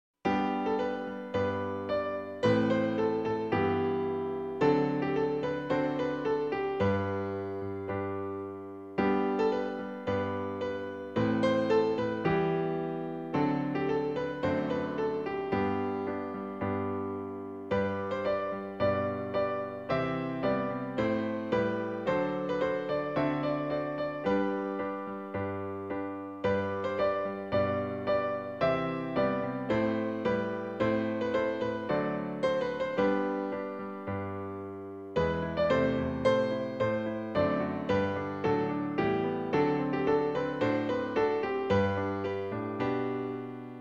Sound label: March; St Thomas